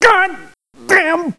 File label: hit; BattleSNDS